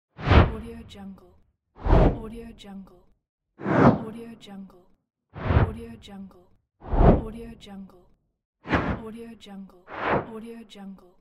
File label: swish